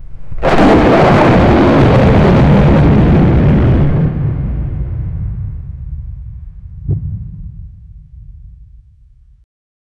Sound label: lion thunder sound effect; animal